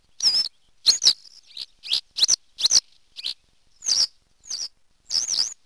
bergeronnette jaune, sons nature

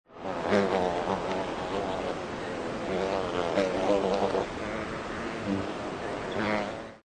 bourdon, sons nature

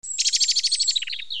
moineau, sons nature

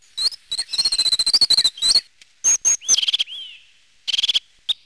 mesange huppee, sons nature; Sons de la nature; Chants d'oiseaux

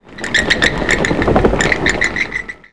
chauve souri, sons nature

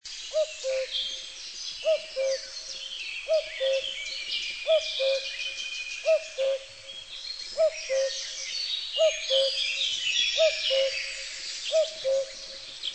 Coucou gris, chants d'oiseaux; sons nature

